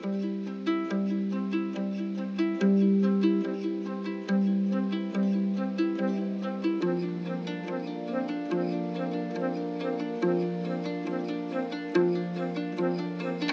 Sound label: Boat music; fujii ps4 windows gamerip 2019